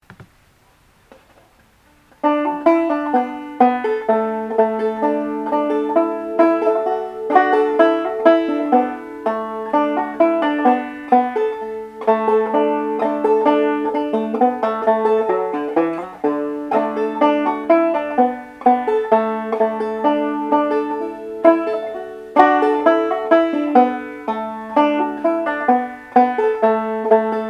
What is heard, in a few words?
arkansas traveler, banjo